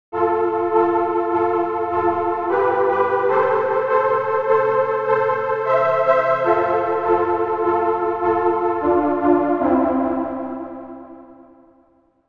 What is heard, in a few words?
Trance PAD